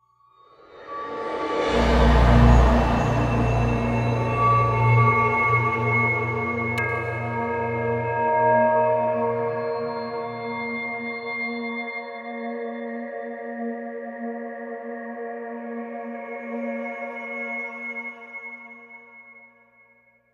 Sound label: Combat Dogfight; Elite Dangerous